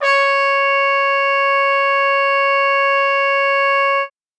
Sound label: trumpet C#5, Trumpet forte; Session Instruments